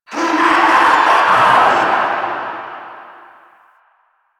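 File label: Infested Distant; Warframe; NineteenNinetyNine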